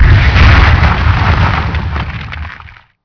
Explosion

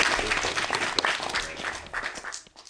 Applause; ProStroke Golf World Tour 2007

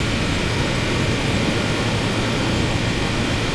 engine; Star Wars Knights Of The Old Republic I; XBOX

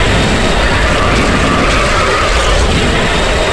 slide car; terrains; Tony Hawk's Project 8; XBOX

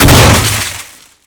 Impact Large; Totaled; XBOX

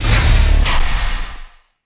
repulsor Pallet raise; machines mechanical

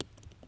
ball bounce path bounce; golf; ProStroke Golf World Tour 2007

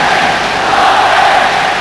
Chant, Crowd; David Beckham Soccer; XBOX

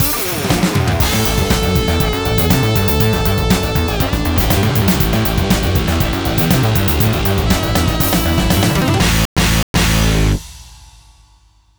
boss start; Phantom Crash

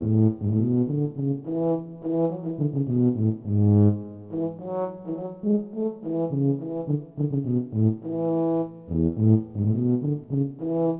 township wright; Sid Meiers Pirates; XBOX

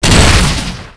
Launch; marcus

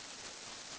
hiss loop, phos grenade; weapons; Soldier Of Fortune II Double Helix; XBOX

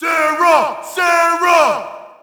Shout, audience; UFC Tapout 2

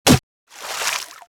water hit, bullet hit; projectiles; Max Payne

